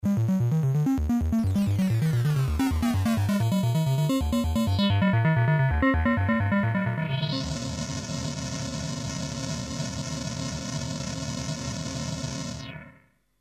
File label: fx vco